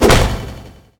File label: spectral; garrysmod